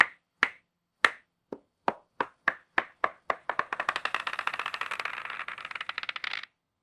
bingo balls; fertig classic games

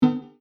ui pick

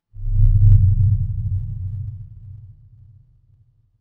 solar flare; orbit

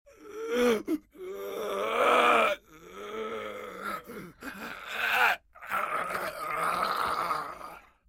zombie death; LegendsOfCoyoteGulch

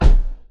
kart thump

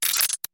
glitch; fertig classic games

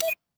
vega endturn; fertig classic games

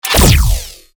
alien death; Asteroids-2026

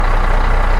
engine heavy

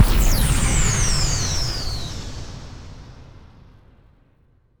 planet beam; fertig-classic-games; Tents; vega

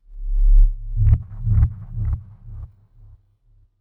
solar flare; orbit

